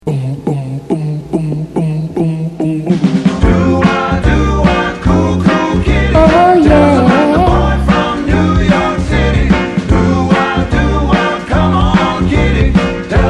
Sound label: 50s & 60s